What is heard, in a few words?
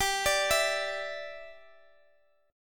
G 5th 6th G; G56 Chord {X X 17 19 17 X} arpeggiated